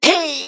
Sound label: Rogue Prepare; Pecheneg